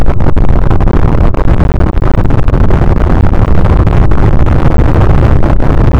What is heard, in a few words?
loud